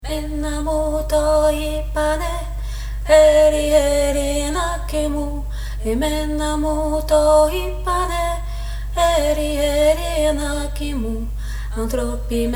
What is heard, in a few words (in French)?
voix basse; Trad. Grec; Danse Kalamatianos